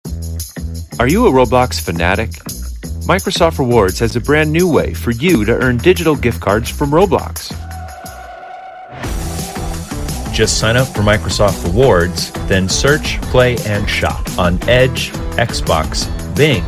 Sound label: electric song